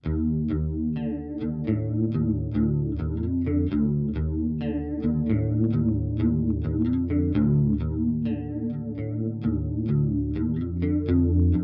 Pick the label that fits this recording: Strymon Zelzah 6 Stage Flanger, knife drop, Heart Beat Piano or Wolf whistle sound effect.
Strymon Zelzah 6 Stage Flanger